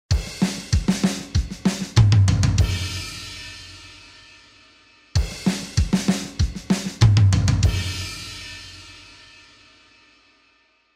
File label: fun drums